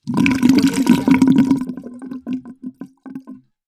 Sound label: sink draining